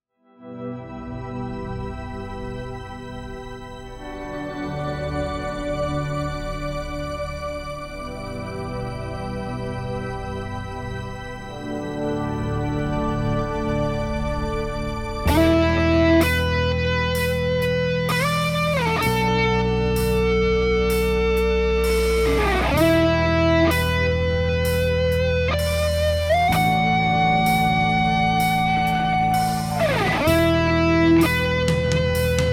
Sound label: mainmenu, ambient metal; SuperOrxoBros HS18